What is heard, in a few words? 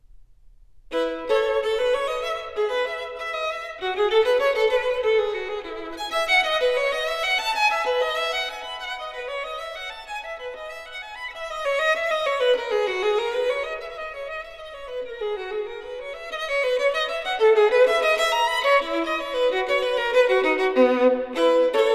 solo violin, E major